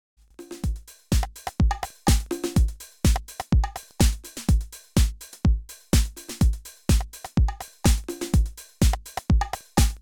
Roland DJ 808 Samples und Kanal FX

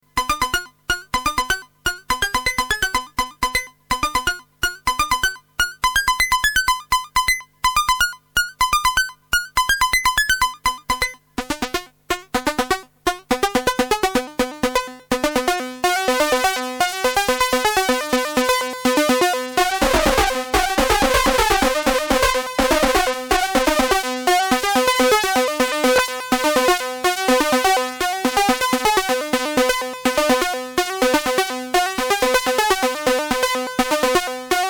Solus Sequenz

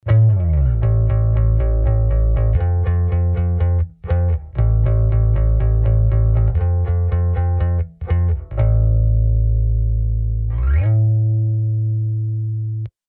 Loop Bass trocken; Samplitude Pro X6

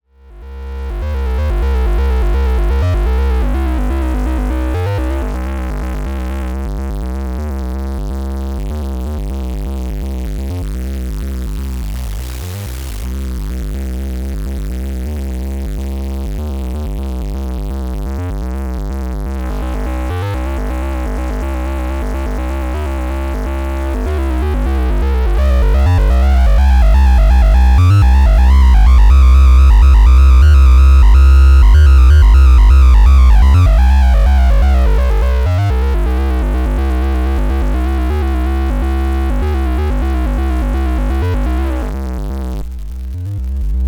Tri Wave Bit Reduktion und Gain; Xaoc Devices Leibniz Binary Subsystem

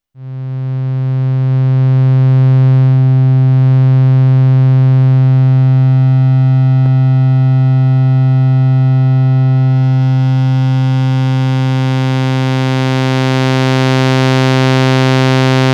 Wellenform Überblendung

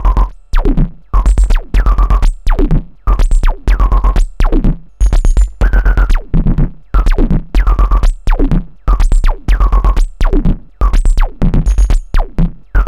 Drums Liquid Glitcher Tonhöhenänderung Trigger am Drum Out; Error Instruments

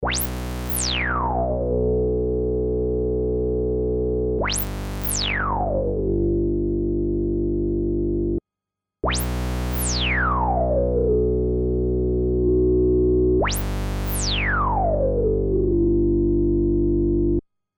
Resonanztest

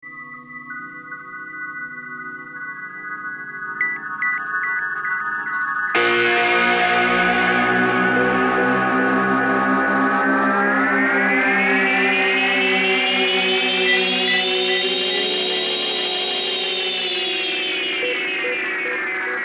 Feedback und Speedtuning

